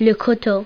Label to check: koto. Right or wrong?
Right